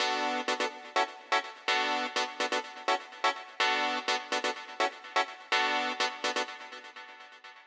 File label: Harmony Synth, 125bpm Bm; Zero-G; Nu Disco; Construction Kits; Fashion Week